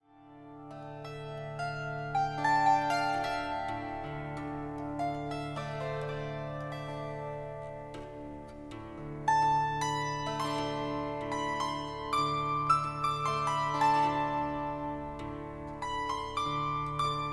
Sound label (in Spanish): Lamento, salterio